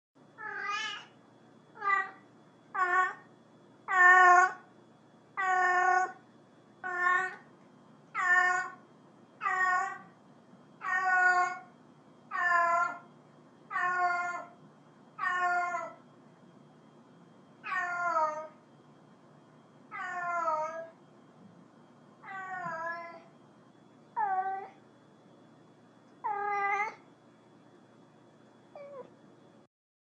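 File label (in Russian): female cat calling for tomcat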